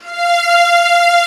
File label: Vla F4; Digital Sound Factory Virtuoso